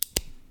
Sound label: click; outfly